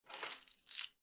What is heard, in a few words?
Keys Pick Up Off Wood Table